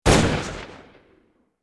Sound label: Bullet Time; FXHome; Bullet and Gun